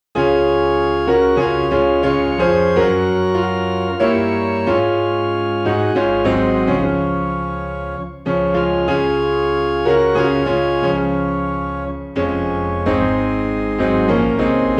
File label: Piano and Organ in D Flat; Jesus Paid It All